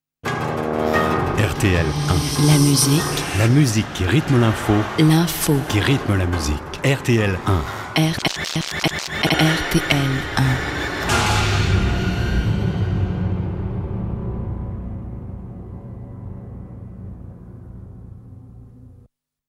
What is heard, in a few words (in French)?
la musique qui rythm l'info; rtl1